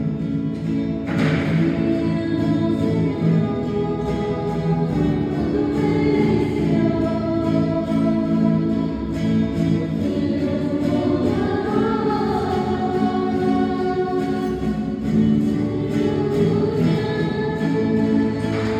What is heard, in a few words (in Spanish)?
canto de asperción; rocianos